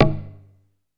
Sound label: PERC G1; SEQ AND PERC; Music Store - Claudius Diemeter Schwerlos